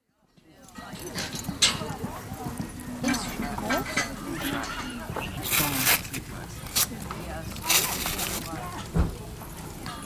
Polo, horselines after match, noisy with horses being untacked, washed down, etc, with some chat, traffic & distinct speech; Horse Sound Effects; BBC